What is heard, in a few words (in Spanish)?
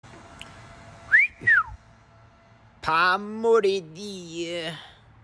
bomba; antonio